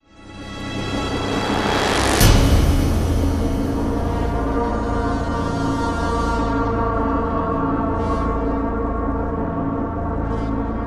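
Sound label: sting power end; MEGATRONS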